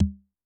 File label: Yamaha DTXpress-II Tom